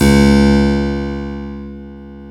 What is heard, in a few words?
KEY HRPCR, Roland; Roland - LA Composer vol. 1